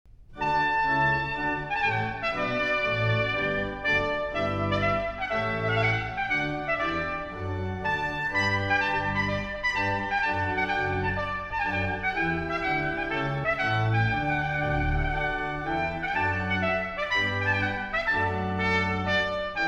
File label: Trumpet Tune, Classical-Trumpet